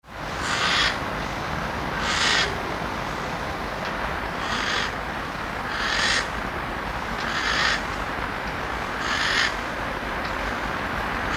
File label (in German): Schleiereule Fauchen